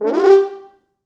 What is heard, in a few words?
Horns Sax, FrHorns FXMOD; G3; East West; Quantum Leap Horns Sax; 4 FrHorns FXMOD